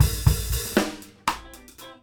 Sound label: FILL